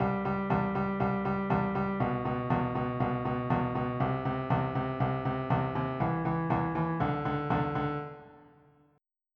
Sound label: new wave